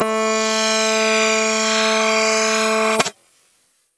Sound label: guitar reed